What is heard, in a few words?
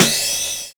PHASE CYM; Univers Sons